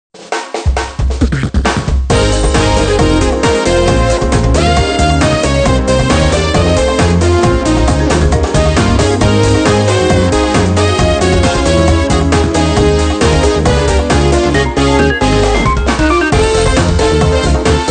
Music Success event

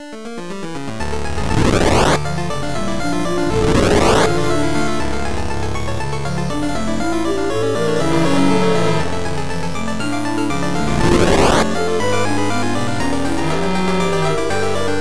Loading Screen; Dead Ops Arcade 2

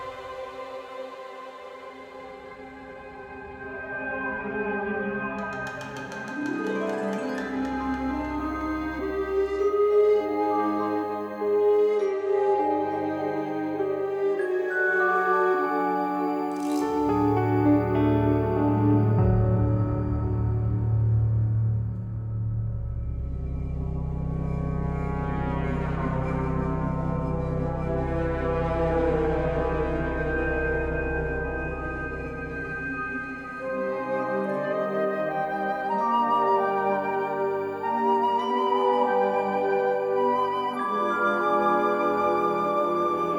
main menu loop; roguebook

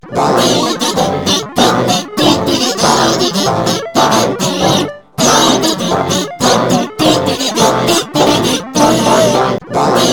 Salmon Run - Fish Stick Song

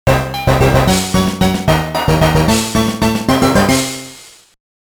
Beat Final Boss; SpongeBob SquarePants Lights, Camera, Pants; GBA

